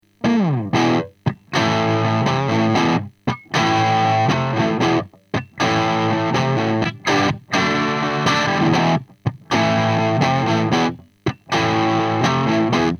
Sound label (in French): SH4, Canal 3 à fond, SH4 bridge; White Jack, un 3 canaux; AMPLI